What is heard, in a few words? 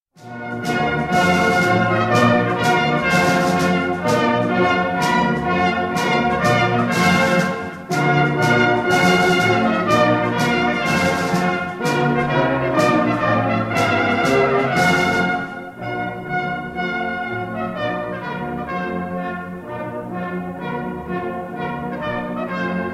Trumpet Tune and Air